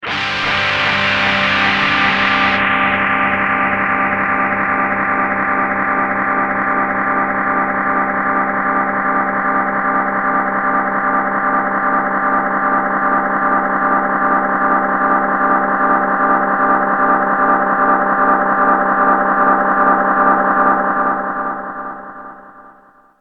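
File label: guitar, AD202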